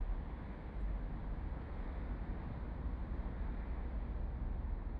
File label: Traffic City; non 16dB Rw47